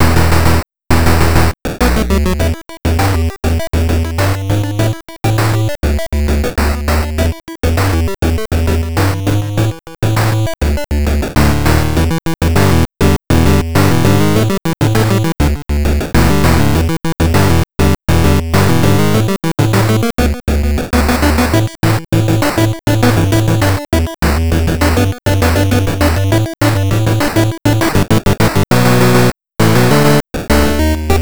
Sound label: Arcade Level (8-bit)